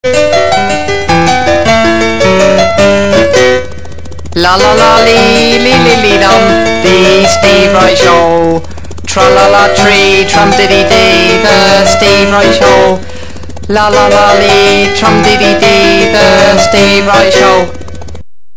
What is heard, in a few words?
lalala